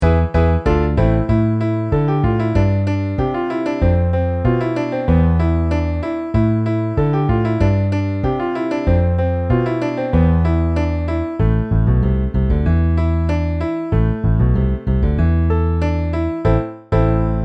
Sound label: Piano Bass MIDI